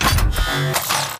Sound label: Servo Jog; Titanfall 2